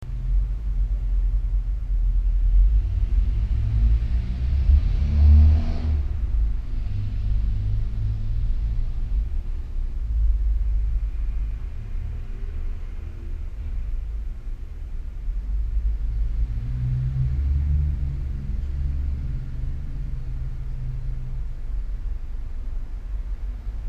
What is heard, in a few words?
atmos distant traffic; MILJÖER; ATMOSFÄRER - DIVERSE